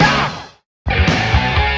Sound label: guitar, Jazzmatazz loops